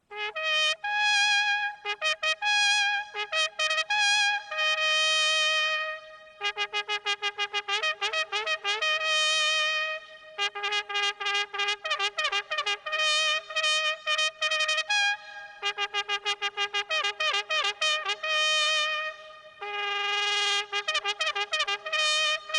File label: Posthorn Gallop